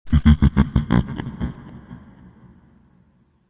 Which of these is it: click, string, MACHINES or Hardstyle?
click